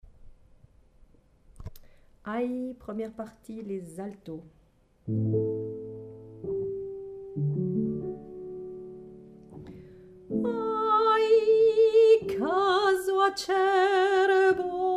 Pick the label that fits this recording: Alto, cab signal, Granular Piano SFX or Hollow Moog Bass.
Alto